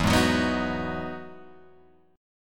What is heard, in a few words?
E Major 9th E down Guitar Open G